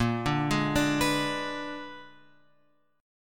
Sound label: A sharp 7th Flat 9th, guitar chord; A#7b9 Chord {6 5 6 7 X 7} arpeggiated